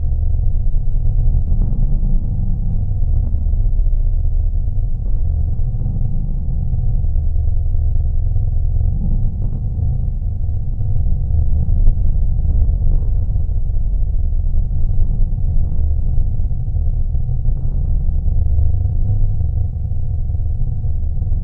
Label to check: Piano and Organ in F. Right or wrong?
Wrong